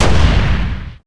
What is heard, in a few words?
explosion generic; projectiles; Max Payne